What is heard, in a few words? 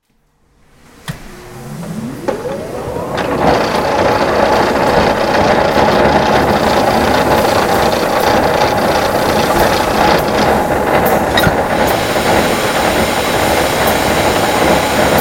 car horns mix w rain; autolite car horn